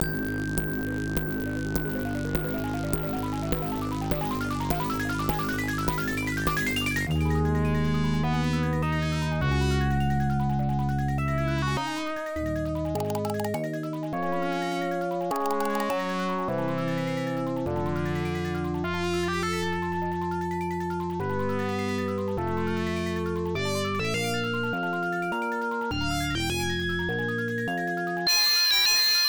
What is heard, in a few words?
Kids are Afraid of Thunder (Thunderstorm Scene); Cadash; Genesis